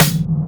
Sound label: Claps & Snares